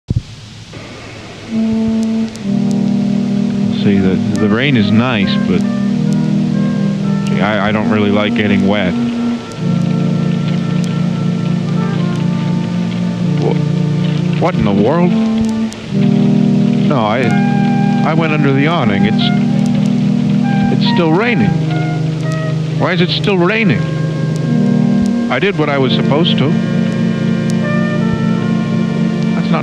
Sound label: rain